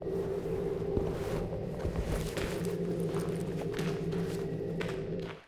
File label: Laser; Yesterday Origins; Switch, PS4, PC, iOS, macOS; SFX VM John Laser Taupe fx